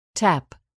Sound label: tap